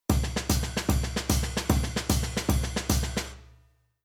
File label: Rhythm Swing Jazz